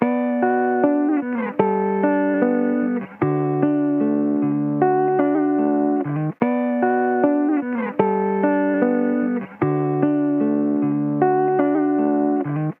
Electric Guitar - 75 BPM B Min; Dry; Melodics; Cymatics; Eternity Sample Pack; Guitars - Electric

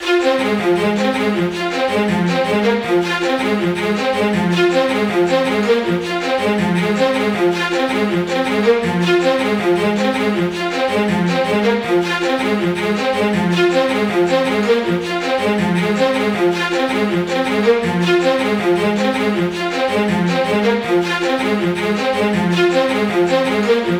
Staccato Strings - Fm 80Bpm; Construction Kits; STEMS; Ghosthack; SkyRealm; Kit 08 - Into The Light

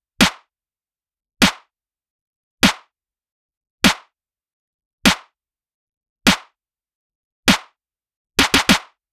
Phonk 99bpm Snare; EDM Drums; Drum Kits; Stems; Ghosthack; Drum Mega Bundle Demo; Ultimate EDM Drums; Phonk Kit 03

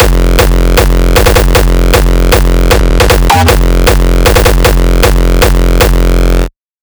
kicks loop 155; GhostHack

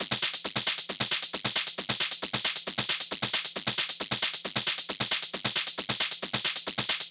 Hat Loop 135, Techno

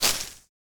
Footstep Grass Heavy; Footsteps

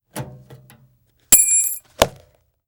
Bell Falls; Fairlight Sound Lib

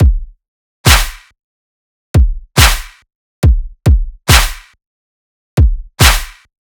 Kick & Clap 140BPM, dubstep; Loops; Drums; Ghosthack; Ghosthack - AC2024 - Day 13 - Dubstep Sounds 2025

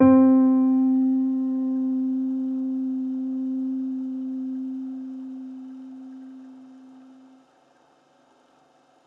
KEYS Classic (C); One Shots; Orchid

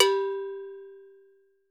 Cowbell - G; Percussion; Cymatics - Infinity Beta Pack 2.0; Zeus Percussion Collection

